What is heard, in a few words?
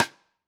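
Pearl Piccolo Side Snare On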